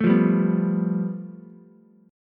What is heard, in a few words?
A 7th Suspended 2nd Sharp 5th, Ukulele; A7sus2#5 strummed; JGuitar